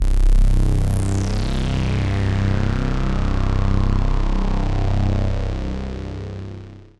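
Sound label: PAD, atmospheric pads; Best Service; XX Large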